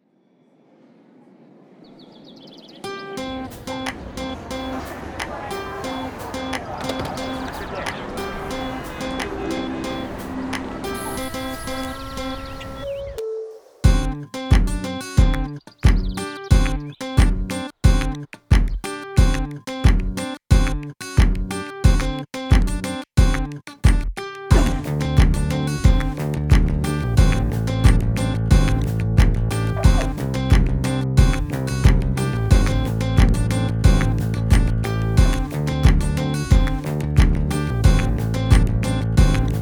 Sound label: music with bass